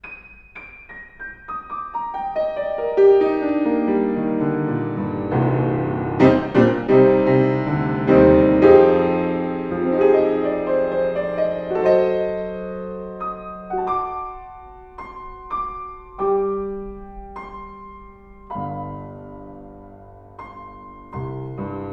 HF Beam Piano Front Closed

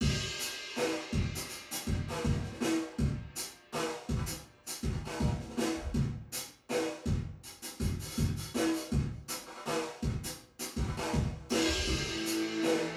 Mix Reverb Size

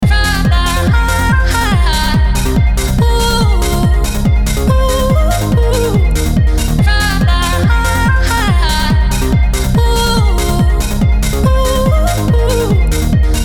Kick HF Mono Toggle

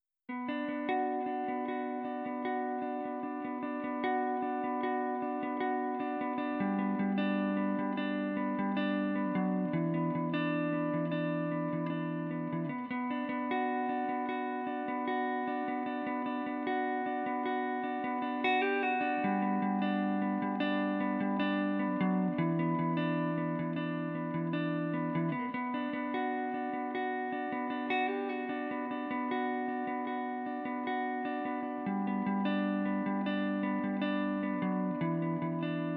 Gtr Para Comp

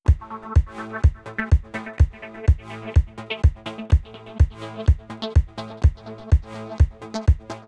Kick Synth Ducking

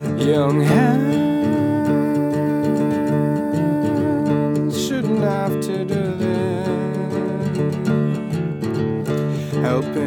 Ac Gtr No Trans X

